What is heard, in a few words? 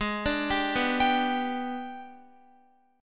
Ab Minor Major 7th Flat 5th, down, Guitar; AbmM7b5; arpeggiated